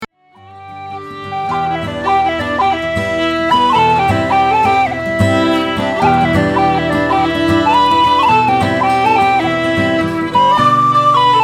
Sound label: Pilgrim of Sorrow whistle excerpt